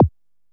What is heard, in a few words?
drm, d3, EMU SP 12, DRUMCOMPUTER